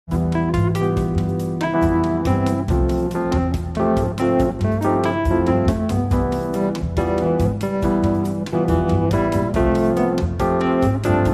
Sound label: Arabian Rumba